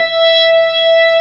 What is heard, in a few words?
Organ B3 Hammond, E5; Best Service ProSamples vol.55; Retro Sampler